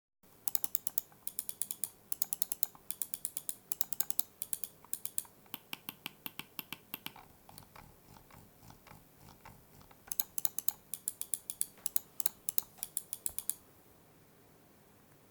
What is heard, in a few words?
Asus ROG Gladius II Origin